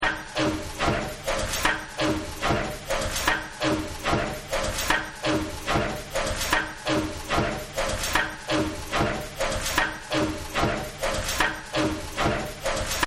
Factory

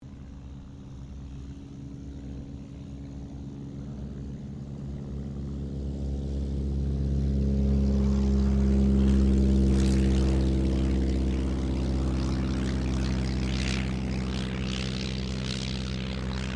AIRCRAFT DH82A DEHAVILAND TIGERMOTH TAKEOFF; Mixed selection of Tigermoths from the 1940s

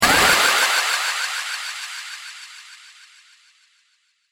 Laser Shock Echo, sci-fi laser sound effect; Laser Future

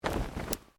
Clothing shirt cotton flap; Movemement sounds for various articles of clothing; Dressing; Human; SoundEffects Foundation2

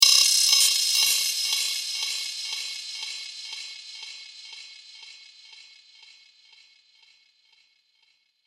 Droid Noise With Decay, robot sound effects; science fiction sound effect

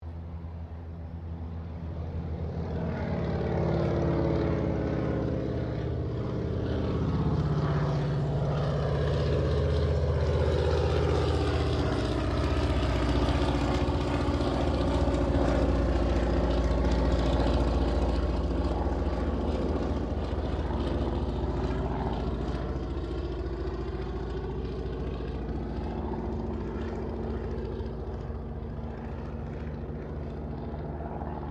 AIRCRAFT SOPWITH PUP REPLICA TAKEOFF; Replica of a 1916 Sopwith Pup; Airplanes

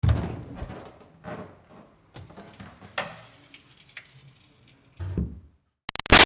Door Wood Push Bathroom O-C; Open - Close; Miscellaneous Doors; Big Room Sound

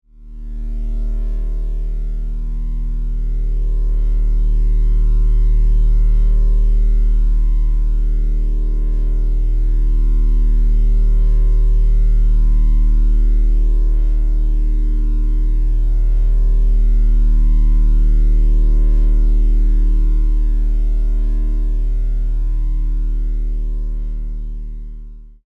Electrical Hum, Scifi, Electrical, Hum; Science, Fiction; Sci Fi Sound Effects

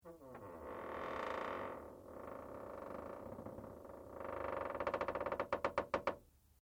METAL CREAK LIGHT; Materials

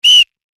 Whistle Blow Short Referee; Sports; Whistles

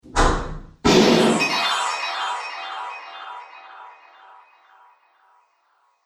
Wizard Amazing Magic Explosion

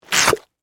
Suction Mud, Texture, Suction, Mud; Water Sound Effects; Water (Sound Effects:Nature); BlastWave

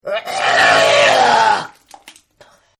Vomit; Vomiting; Human; Partners In Rhyme

